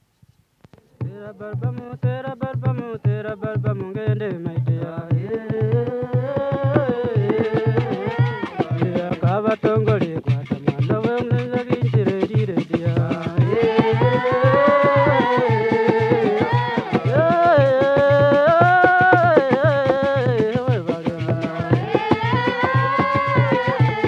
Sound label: Esaasi, uganda